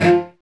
STR Vc Attack; Roland L-CD702; Vc Marc&Harm

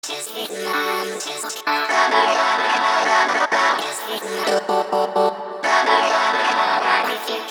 D 128 Dry, MELO + FILTER; RobotGirlfriend; RoBKTA Sample Pack Supreme

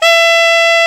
SAX A. MF E, Alto Short; Roland LCDP07 Super Sax

